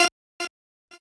normal hit normal

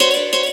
guitar

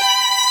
violin; osu

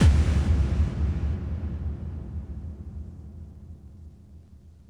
normal hitfinish

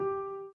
Piano Chord

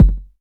kick; osu

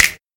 Clap; osu

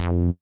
F3 bass

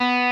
Arp.

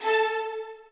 strings

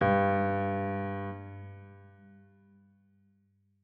Piano G1

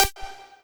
lead

